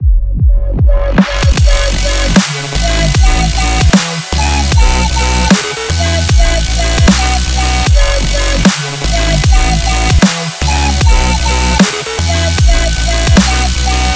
dubstep

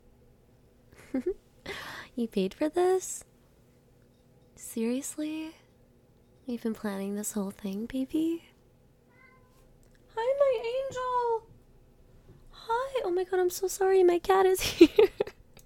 blooper kitty

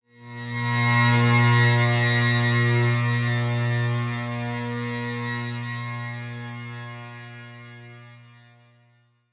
pad

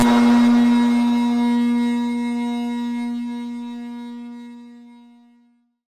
blip

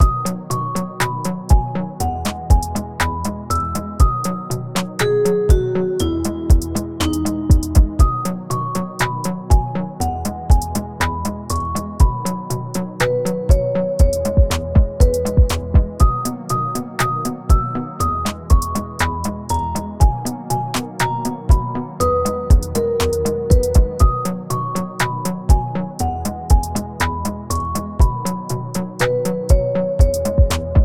Autumn Jingle

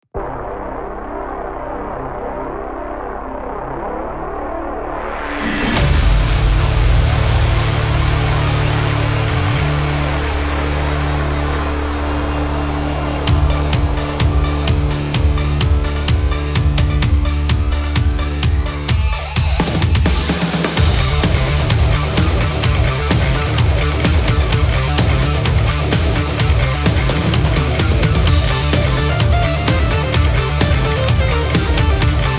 metal beat

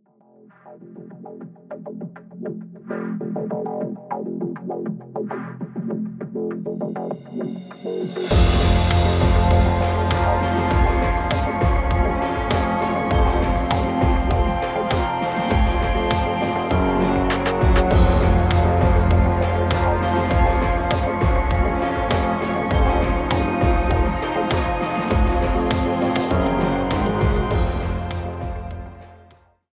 synthful sands

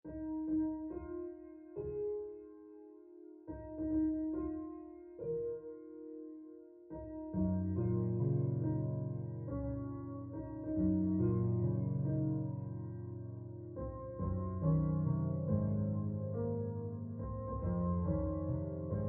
Ambient Music

